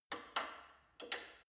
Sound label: gym bicycle saddle unlock soft; sport freizeit